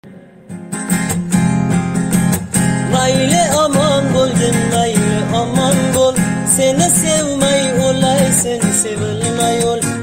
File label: cover gitara